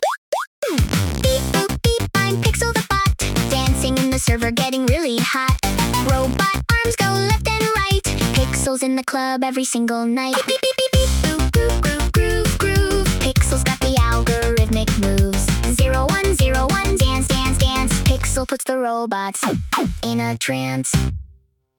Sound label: pixel beep boop